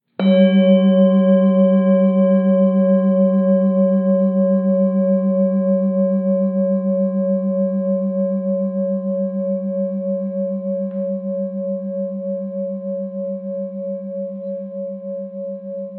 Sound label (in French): Bol chantant tibetain sacre martele a la main